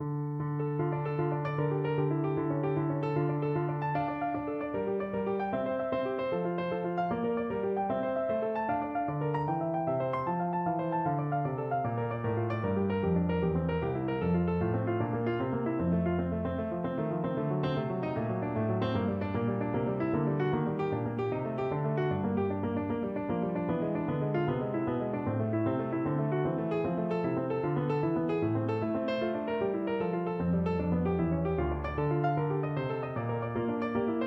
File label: Prelude D Minor, piano